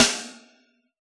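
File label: snare drum rock rim, Yamaha DJX Rock Kit; 144 Rock Kit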